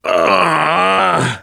hurt major, survivor voice, biker

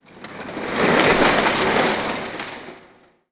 junk debris, ambient

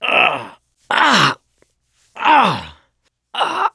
choke, mechanic; voice

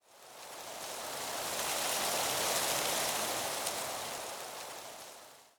wind leaves mild gust, nature

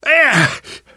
hurt minor, survivor voice; namvet; Left 4 Dead 2; left4dead2 dlc2

